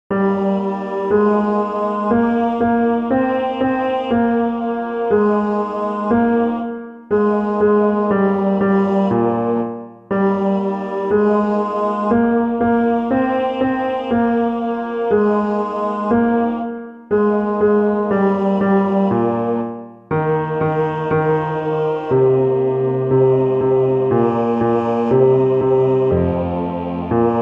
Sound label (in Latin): Ubi Caritas bass